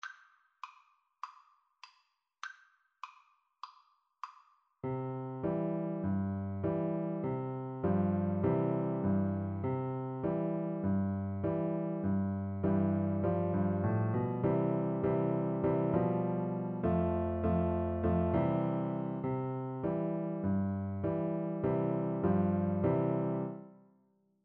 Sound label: Piano Duet